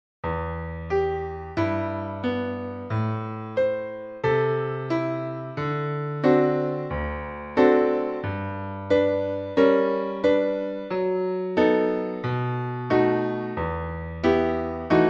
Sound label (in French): piano sans clic a 90 bpm